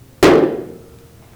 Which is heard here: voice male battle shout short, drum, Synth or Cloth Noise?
drum